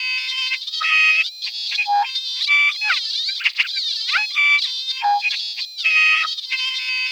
SING SCAN, VOCALIZER; Spectrasonic Distorted Reality 2

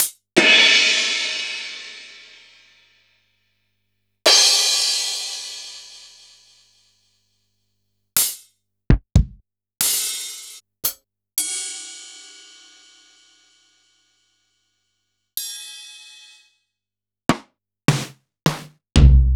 Kurzweil Kit; Sliced

